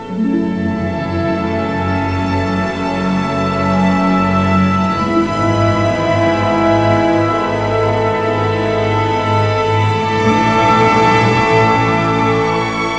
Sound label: cap music; overlord